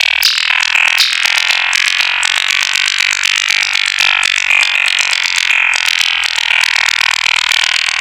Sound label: Structured Rhythm, IDM Rhythms